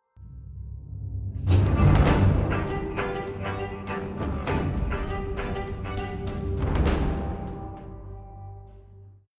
String; assassin s creed unity gamerip 2014

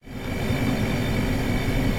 switch idle

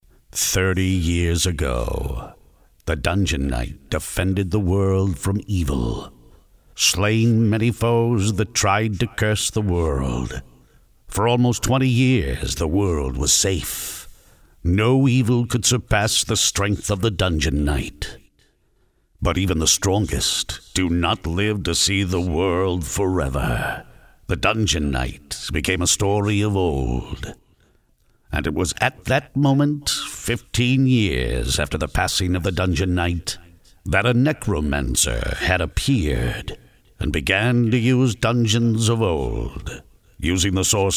INTRODUCTION STORY

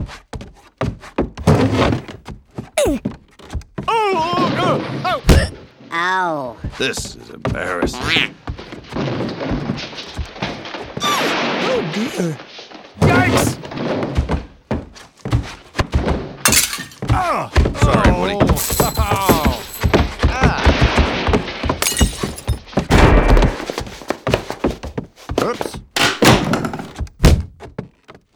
Toy sounds; Toy Story 3

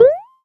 SFX - Hopping; Mario Kart 8

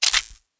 Weapon Foley (Laser)

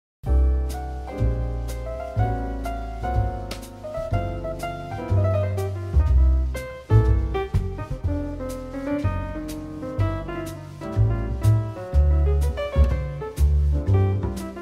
BGM Menu Hub; family guy back to the multiverse gamerip

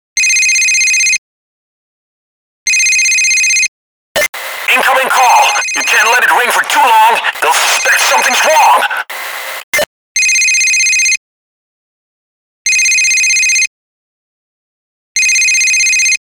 Ringtone, Incoming call; Payday The Game; Bain

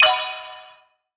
star burst; Golf Blitz 1.12

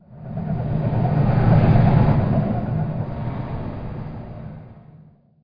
Ambient; Unreal Development Kit

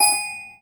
Button Bowl; Newlocknew; 3layer Filter; The Doll Attack

